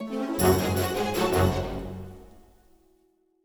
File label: Crowd Traffic Jam Theme 160bpm End; asterix obelix slap them all 2